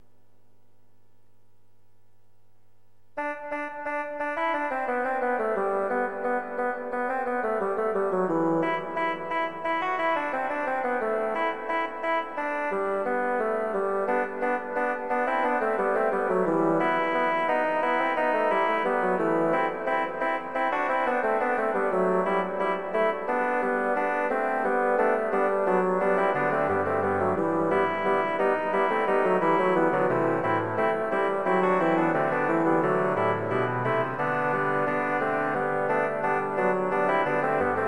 kanon fuer 3 fagotte partitur

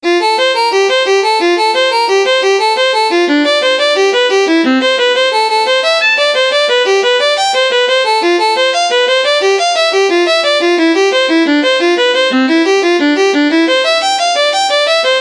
etueden nach js bach nr 02 violine

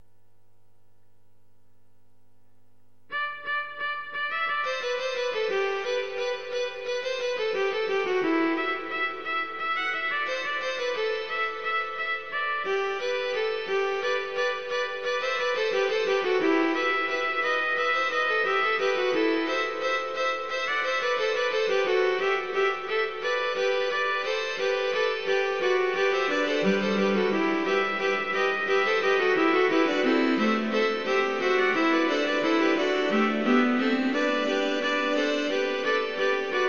kanon fuer 3 violen

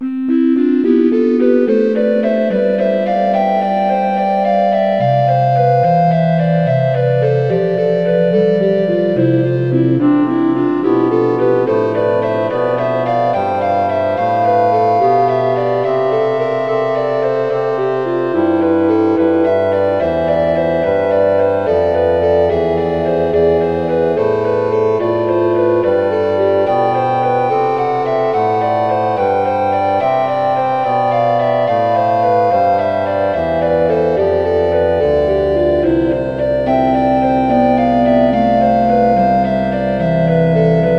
vom himmel hoch da komm ich her orgel

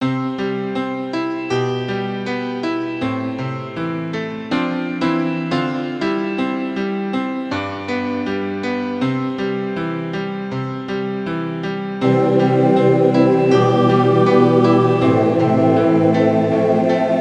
ttbb klavierpartitur

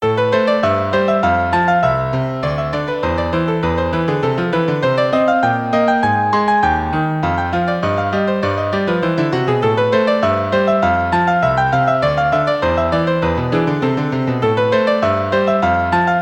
klavier solo; rumaenische taenze nr. 3

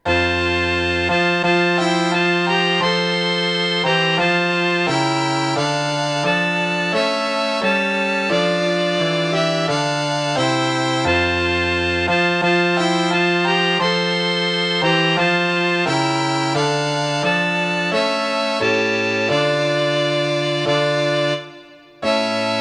nationalhymne ukraine streichquartett u klavier

